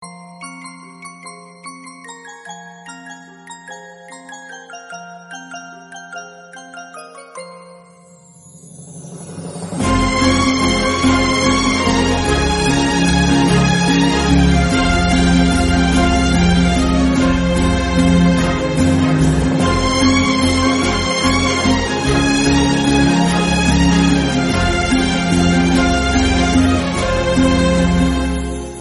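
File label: instrumental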